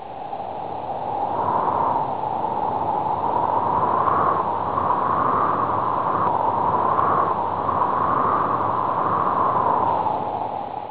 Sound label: wind